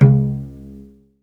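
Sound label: SOLO CELLO, Synclavier Strings; ILIO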